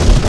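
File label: Wood Ship Hit; Civ4 warlords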